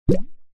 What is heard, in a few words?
bubble pop